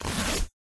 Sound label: zipper; zippers